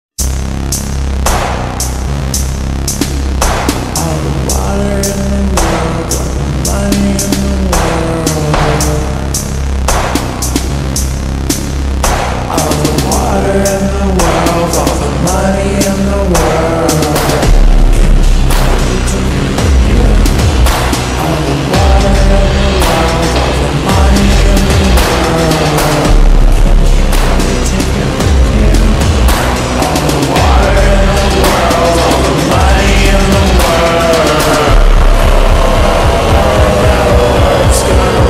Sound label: slowed bass boosted reverb remix